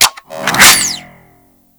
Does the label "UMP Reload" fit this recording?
Yes